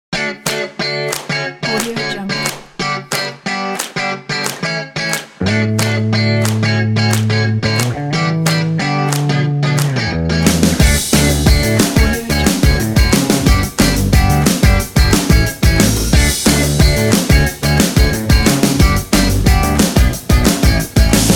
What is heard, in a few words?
happy kids